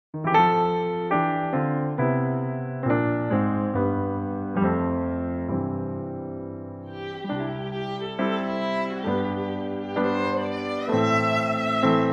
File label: C piano play violin reference